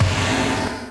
IND; Zero G; Man Machine

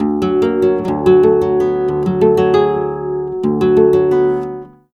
guitar arpeggio, Flamenco; Best Service ProSamples vol.46 - Flamenco

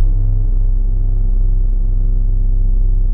BASS, Drum & Bass 160 BPM; Ueberschall; Drum & Bass Flash Trackz

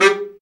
BARI, BARITON SAX; Sound & Vision - Gigapack II CD 1

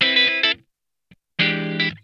TIGHT, Funk Guitar; Zero G - Funk Guitar

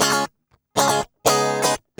GUI 120 5bC#; Best Service Gigapack 2

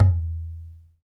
Tablas; Spectrasonics; Supreme Beats; World Dance

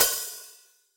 loose hat, Kawai XD-5

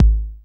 909 BD, TR-909; Best Service Dance Mega House Dance

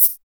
BRITE SHAKR, SHAKERS

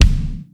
KCK, SONIC DRUMS; Greytsounds VOL-1

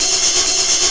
130 BPM, rhythm loops; Rarefaction - Poke in The Ear With A Sharp Stick

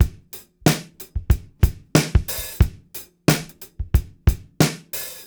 Beat Loops; Zero-G; Monster Beats

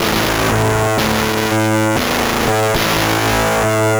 Guitar Loop, 120BPM; musicradar; bitcrushed; Crushed Loops

